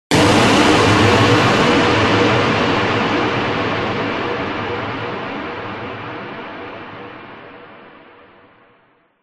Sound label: CINEMATIC HIT Distorted Noise Hit; smartsound